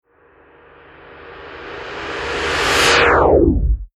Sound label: Reverse Space Implosion Stinger Whoosh Scifi Digital Space Long Scifi; Sound Design; Rise And Dive; Tom Hutchings